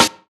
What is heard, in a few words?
old school treble heavy acoustic snare sound a sharp key